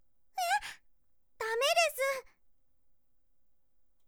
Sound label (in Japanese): エラー音